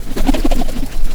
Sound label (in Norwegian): vinger